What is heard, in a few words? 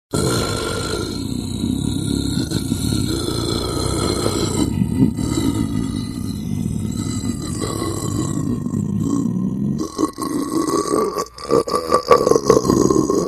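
sounds of zombie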